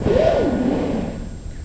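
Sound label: Vest Rub On Pipe End, cloth movement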